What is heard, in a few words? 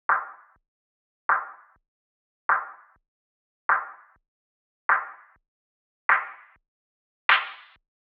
synthwave clap 100bpm; synthwave anthems collection